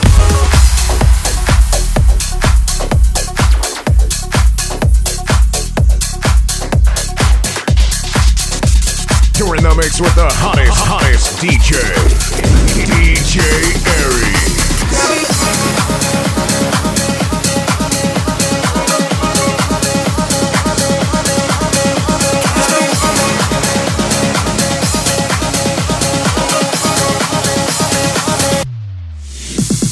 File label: Dance Top40 Party